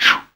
CHLOOO; AMG

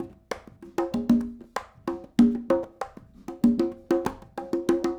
Congas, 70s Analog Funk

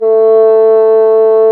BASSOO, Bassoon; Sound & Vision - Gigapack I CD 2 (Roland); Brass&Woods1

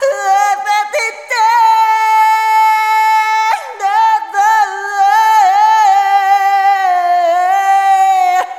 Arrhythmic F

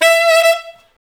SAX A. STAB, Alto Section; Roland L-CD702

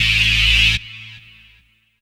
saw lead synth

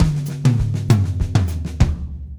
Drumset Groove; Sonic Foundry (Sony Creative Software); Joe Vitale Latin Percussion; Grooves

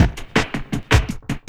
LOOP, 160 BPM, Drum & Bass

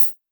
vermona drm1 mk3 hh

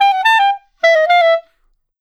Clarinet; Sonic Foundry (Sony Creative Software); Jazz Solos and Sections

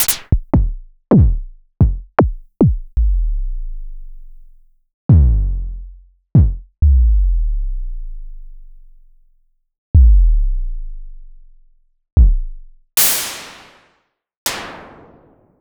Roland SH-09 Drums; Sliced

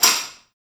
TRASH TAMB; Spectrasonics; Liquid Grooves 1 & 2; METALS

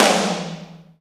HI AMB TOM, ACOUST TOMS; Greytsounds

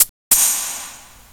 PERC, PERCS KIT; Ragga & Dub Reggae; USB Soundscan vol.27; AKAI

